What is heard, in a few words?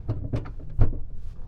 lotus exige, onbrd, door open interior; Exige-S 2013; DPA4021; Pole Position